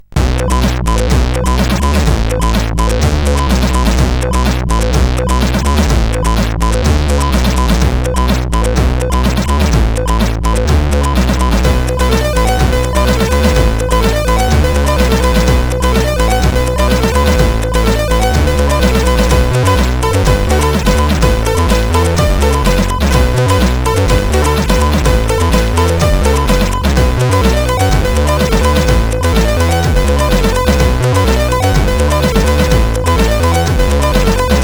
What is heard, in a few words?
sid, CSG8580R5; Foolish Maniacs; Amaze